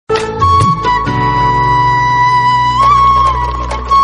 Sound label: flute version